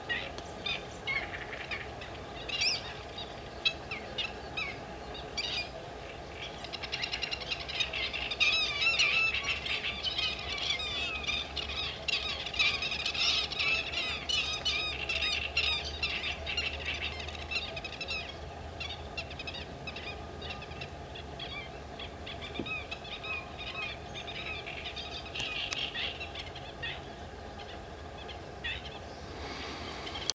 canto verso Cavaliere italia himantopus black winged stilt; Verso di allarme